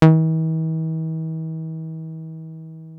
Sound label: 303 D#3, TB 303; Hollywood Edge; Giorgio Moroder Rare Synthesizer Collection